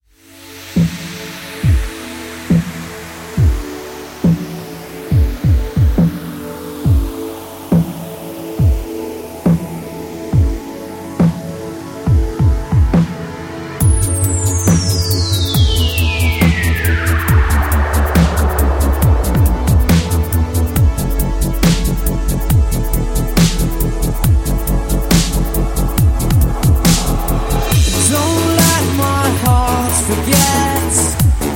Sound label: forever changed dubstep remix